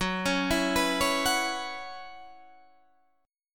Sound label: B Suspended 2nd Suspended 4th, F sharp, chord